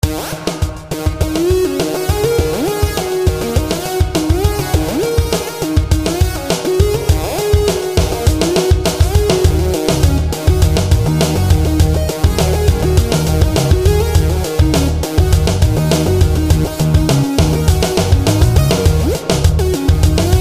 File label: Rock the hits